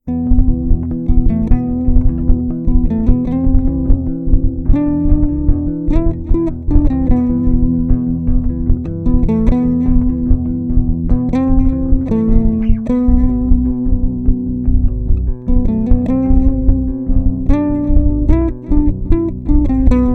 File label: Yamaha TRBX 4 Tracks div EQs